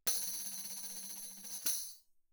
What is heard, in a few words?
tambourine shake roll mf; Percussion